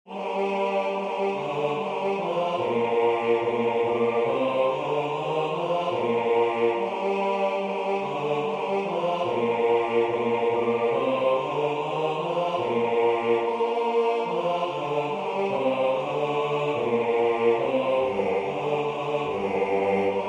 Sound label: pavane B; arbeau; belle qui tiens ma vie